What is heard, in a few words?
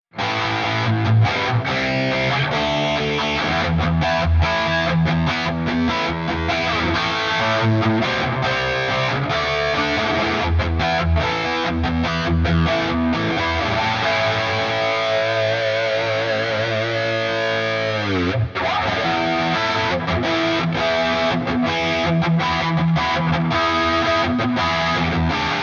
Highgain Rhythm; Gitarren-Plug-ins im Vergleich; Archetype Petrucci